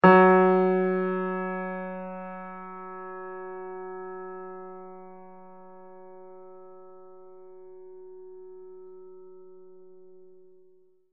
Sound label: loud gb3, piano